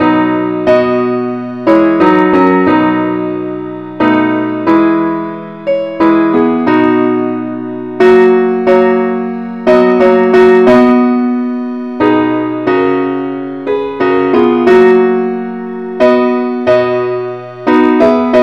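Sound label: parts only keyboard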